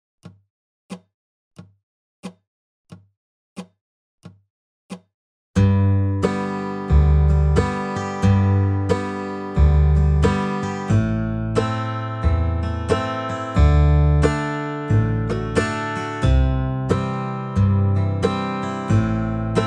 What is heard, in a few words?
backing track - 45 BPM; Holiday 2025 Dobro Zoom Workshops